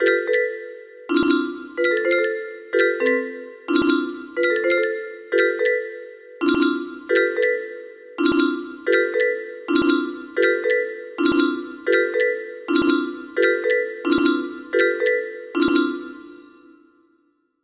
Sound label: melody, music box